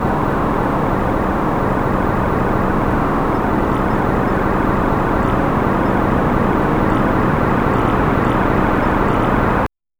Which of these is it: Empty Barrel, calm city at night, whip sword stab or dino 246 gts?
calm city at night